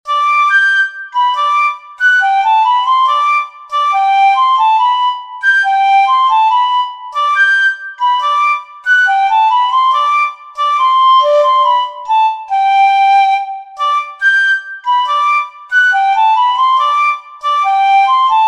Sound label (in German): Morgestraich mit 4ter Stimme Piccolofloete